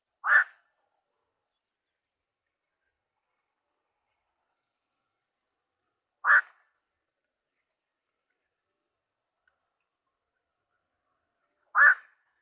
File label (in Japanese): goisagi